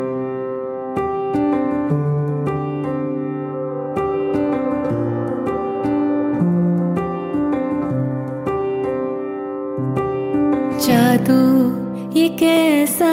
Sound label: music ringtone bollywood ringtones